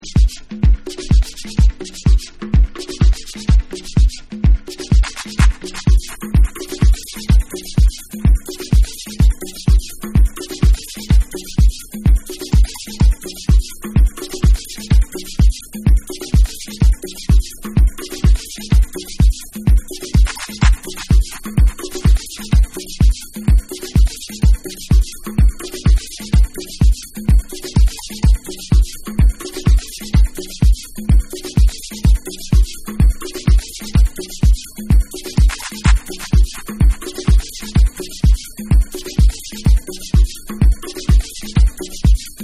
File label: Rhythm